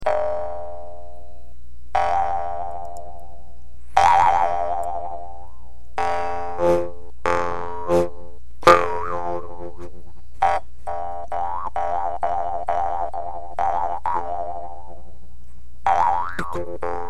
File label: Jew's Harp sample sounds; A demonstration of various sounds that can be produced on this instrument.